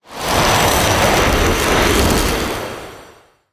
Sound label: Ice Tornado